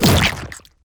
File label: spiders mechanic spawner explode